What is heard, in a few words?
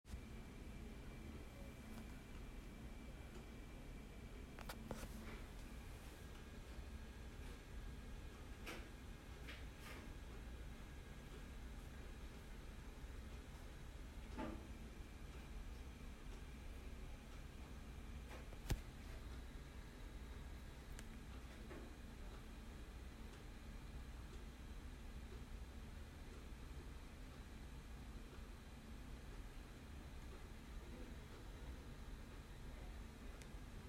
Doctors office